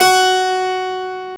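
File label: Harpsichord; CMI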